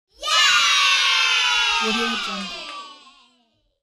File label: kids cheering yay